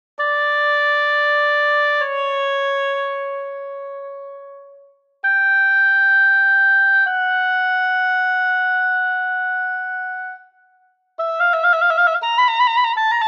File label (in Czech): OBOE celek